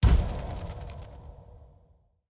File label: spin start; netent; dracula